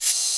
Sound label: SHAKAN, PERC; NorthStar - The Wizard VOL-2; TSAR PERC